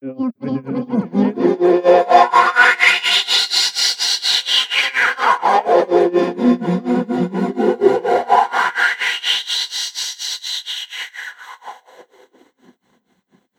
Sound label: MONGOL V FX, Asian Traditions